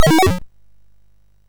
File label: sid MOS6581R3; Gremlins 2-The New Batch